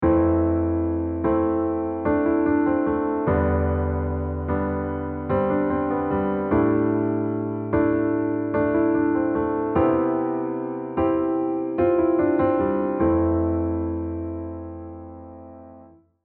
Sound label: 7th sus4